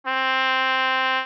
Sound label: trumpet